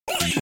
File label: HOO-UGH